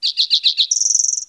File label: chirp, wildlife